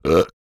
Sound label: burp; OPENAIR; Anechoic